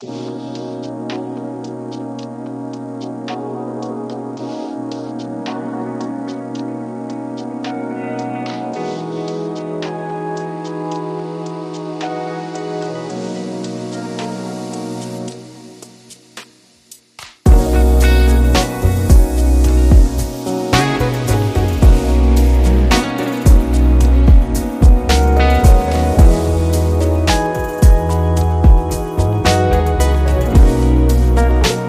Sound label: Beauty Vlog; deluxe